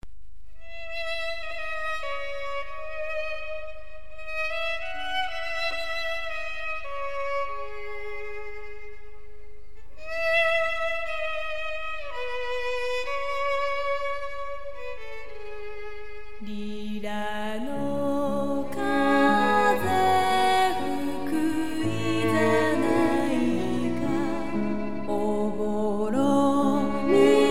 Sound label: violin solo; silvermoonrefuge